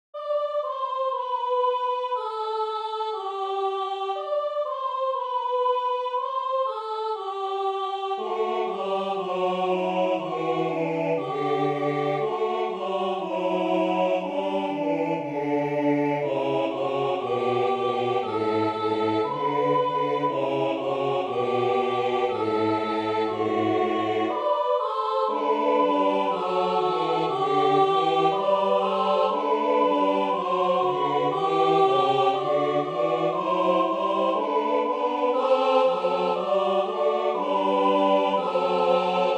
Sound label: Small Russian Fuga